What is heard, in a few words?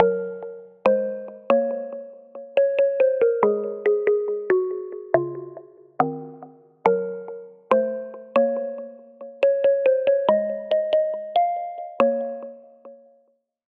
Bell